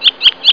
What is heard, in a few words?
tweeter